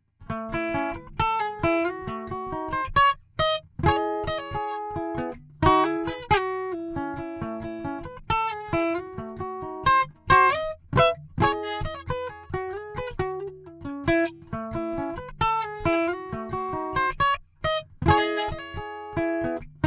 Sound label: Taylor 814 Neumann U87